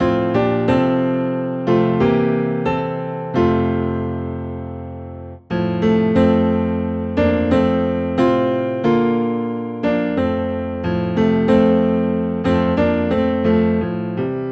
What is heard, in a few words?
Piano Low in F; There is a Fountain